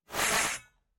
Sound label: water sword; soundscrate